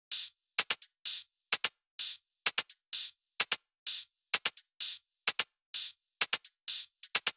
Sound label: 128BPM Emote Kit; Vengeance Samples